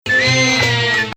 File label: Bayati Octave